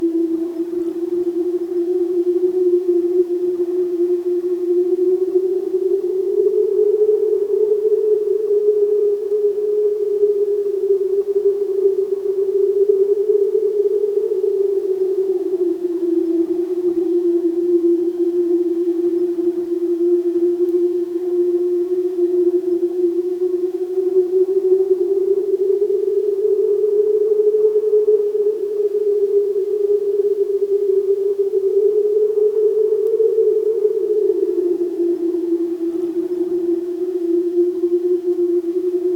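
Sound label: ancient trees in dark medieval, ethereal wind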